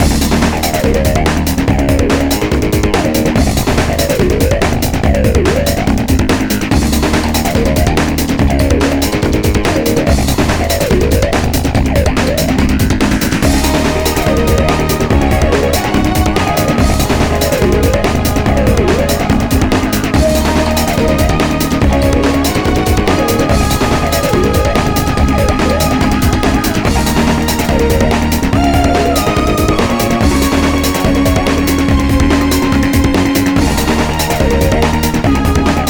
Battle Loop; Asterix Obelix XXL 2

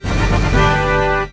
Jingle Power Up; rayman 3 hoodlum havoc gc ps2 windows xbox 2003

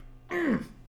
argh

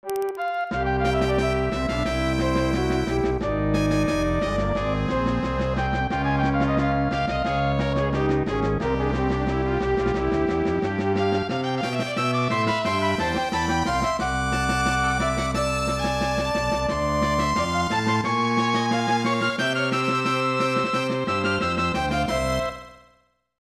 Orchestra Concert; lennus 2; snes

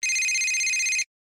Cell Ringing

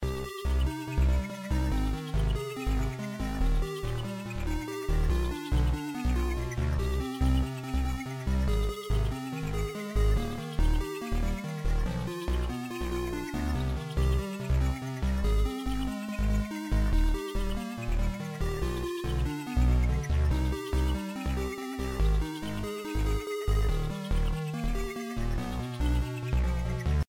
alt menu music; Cally's Trials

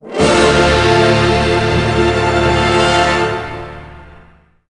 Jingle Fail; Jewels of the Ages 2011

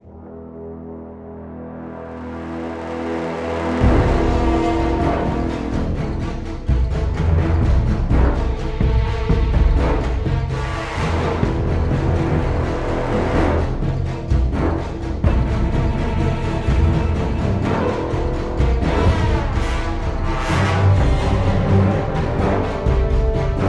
Loop Fight; Game of Thrones: A Telltale Games Series

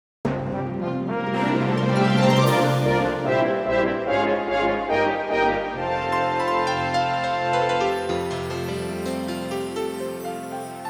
All Clear; Chip-chan Kick; TurboGrafx-16

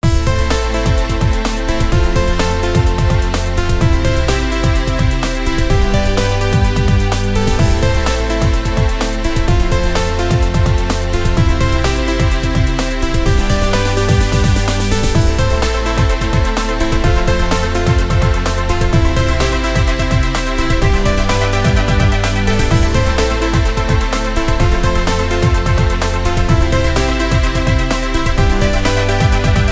Win Jingle; colin mcrae rally the official soundtrack

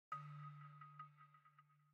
Balafon

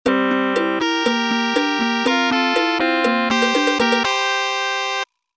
Beat; Baldi

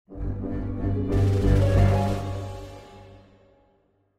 Stinger Light snare; Angry Birds Star Wars 2; Crimson

